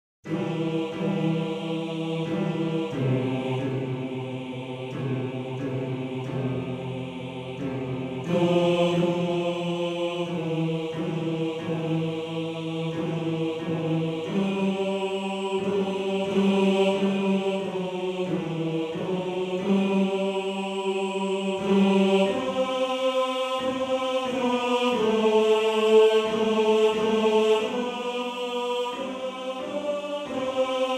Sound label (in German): Bass; Morgenrot; Bass 1